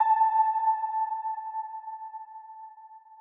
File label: Crystal A6